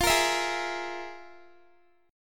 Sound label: F Minor Major 7th Double Flat 5th Guitar; FmM7bb5 strummed; 4F,4A,5C,5G,5C,6E